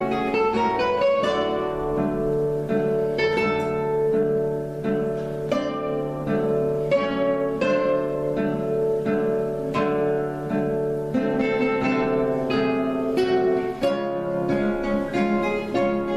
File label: croatian guitar; ringtone